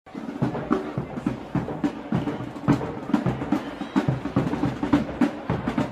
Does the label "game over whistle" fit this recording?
No